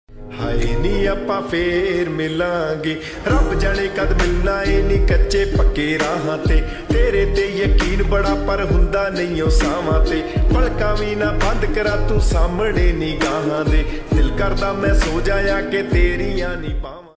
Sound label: punjabi song ringtone